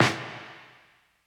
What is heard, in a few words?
80s reverb snare